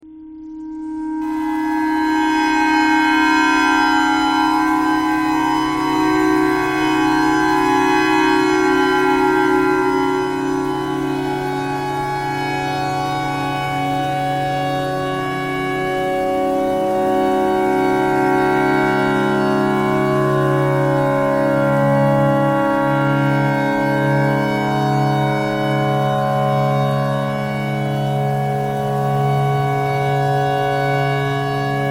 tornado siren clarinet thread pentatonic

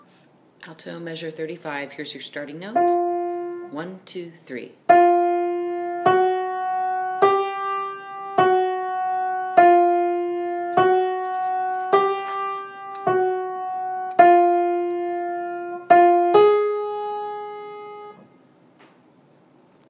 Alto

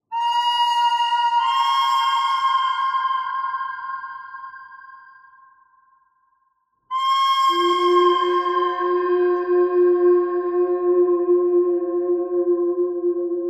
bird thread simple

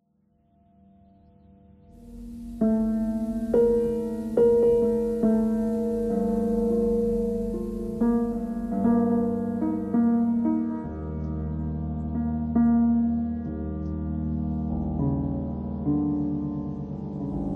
piano noise

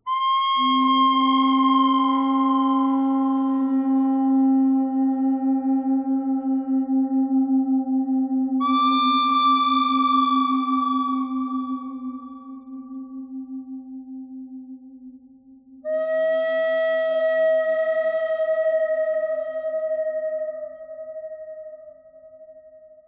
bird thread noise; noreverb

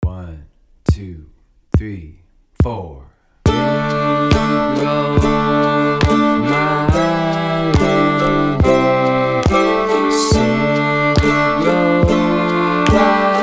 Shady Grove Simple Mix, fiddle

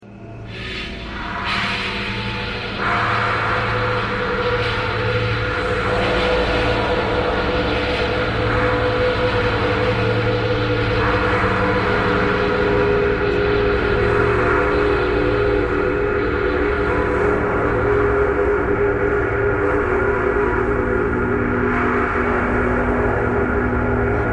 traffic core birds thread noise

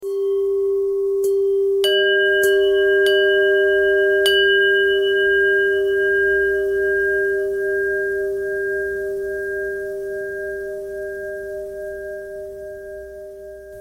bell thread bend thread

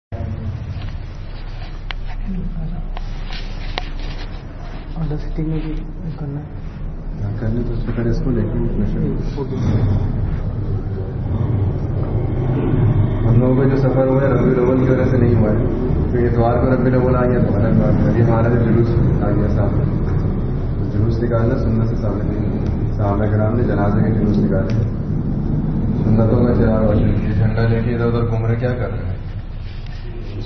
Naat Shreef; MadrsBanat

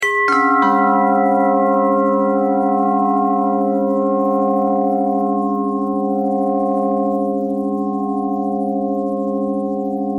bell thread

